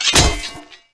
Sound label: Flying Staff die; Seer; GamigoNA